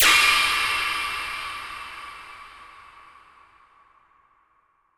Crash, impact samples

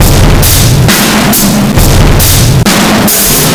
System Overload Beat, Processed Drumkits

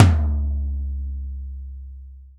TOM, Real Drum Kit, Rock Kit

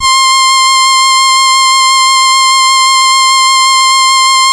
Casio VL1 Violin; Giga Samples Collection

